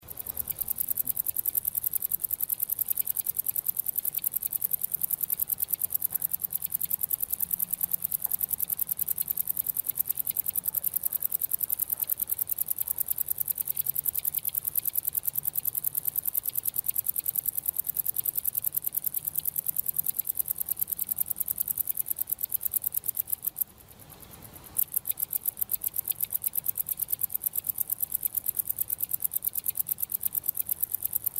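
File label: sound made by a grasshopper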